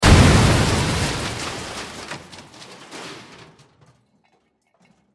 Explosion Crash Debris Large; Military; Warfare; Explosions

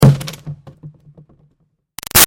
Impact Green Garbage Pail Scrape Rattle, plastic; Impact , Green Garbage Pail , Gritty Scrape , Rattle; Materials

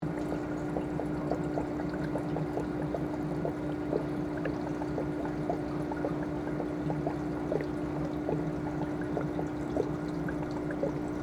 Water Drips Machine, Arcade, Gurgle, Loop; BlastWave

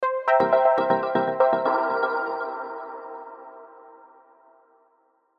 Synth Stab Happy, Synth Stab Happy Jazzy; Musical; Blastwave; Sound Effects:Musical

